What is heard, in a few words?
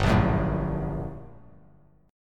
G Minor Major 9th, down; strummed; JGuitar